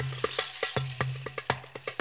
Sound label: drums; stfu and climb2